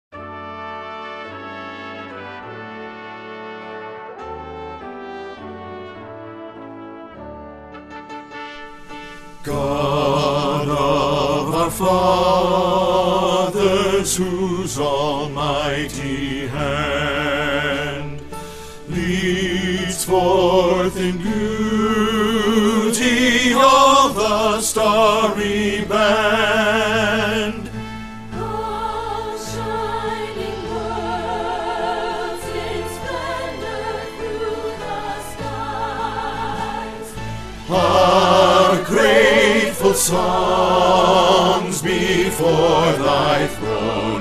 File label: Bass; God of Our Fathers; Choir Rehearsal Parts